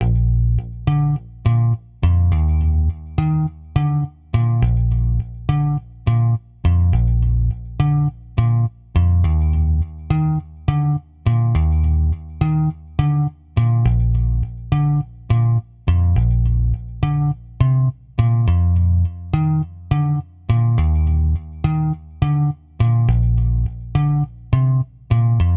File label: Bass loop